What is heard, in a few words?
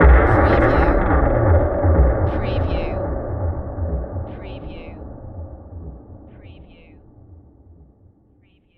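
Production Element Hit Dark; Sound Effects Bible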